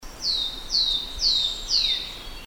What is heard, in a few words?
Green backed Tit, birdcall